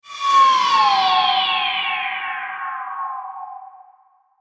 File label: Logo Big Sweep; Sonic Logo Sound Effects; Production Element Imaging Element Accent Transition